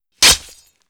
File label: Sword Stab Through Flesh; Weapons & Explosions; Sony Pictures Sound Effects Series Volumes 1-10; Sony Creative Software